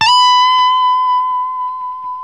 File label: LES PAUL LD, Guitars; Spectrasonics; Hans Zimmer Guitars VOL-1